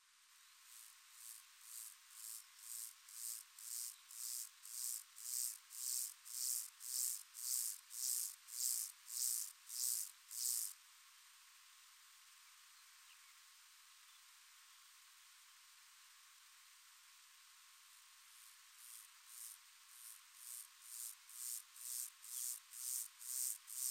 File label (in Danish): køllegræshoppe